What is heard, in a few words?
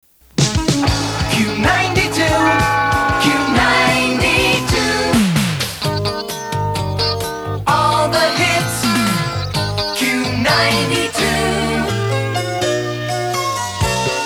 Jingles Hitradio; WMJQ Rochester